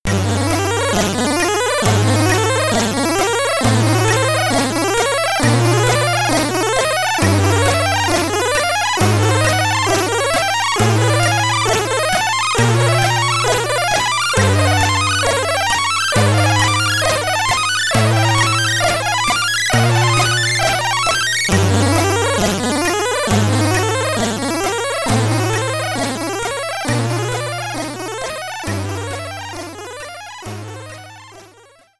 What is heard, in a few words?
Puzzle Game, SID Stereo; Star Paws; Commodore 64